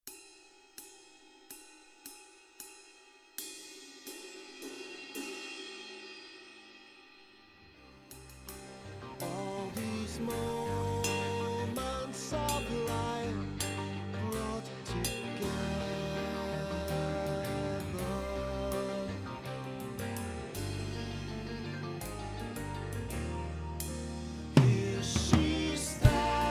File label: ride cymbal